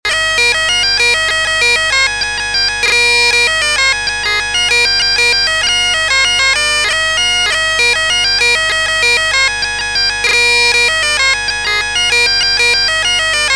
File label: Jig; Bob Dunsires Jig